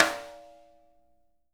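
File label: jazz snares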